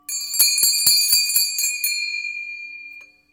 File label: Church Bells Close